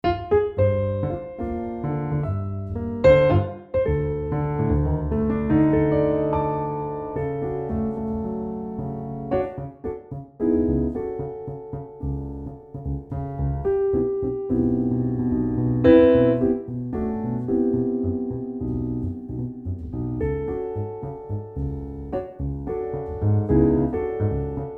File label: CFX 3 Mics Perf, garritan cfx concert grand; garritan cfx concert grand aria player presets